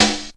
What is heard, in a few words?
natural steel snare drum a sharp key